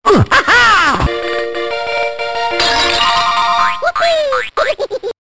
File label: jingle ~ level completed